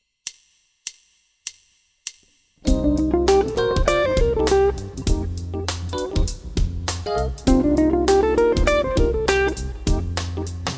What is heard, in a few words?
Dorian Lick